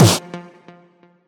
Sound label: combo power; quatuor